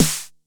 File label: Snare 909 Tune